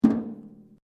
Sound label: RUBBER ON METAL HOLLOW; Materials